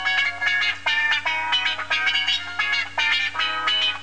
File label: wah, guitar; amiga